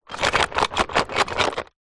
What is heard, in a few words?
household makeup in pouch shake